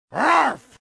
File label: bark